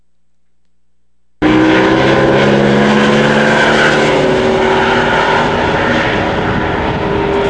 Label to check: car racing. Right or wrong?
Right